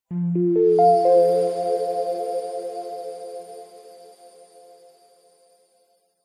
multimedia game sound zen game soft warm relaxing positive tone